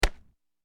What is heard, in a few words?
Body Hit